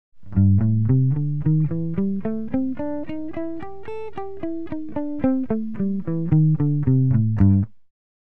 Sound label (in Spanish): blues menor